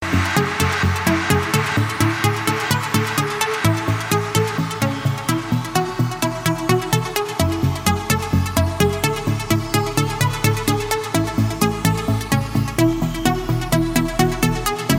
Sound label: Trance House